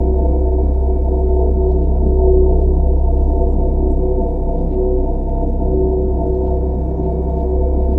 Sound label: walk